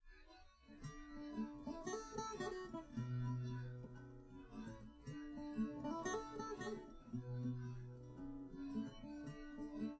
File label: no drums live rec; SiSEC10; underdetermined